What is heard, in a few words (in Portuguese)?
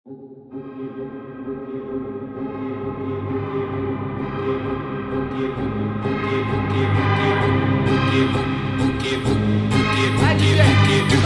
ritmo do tambor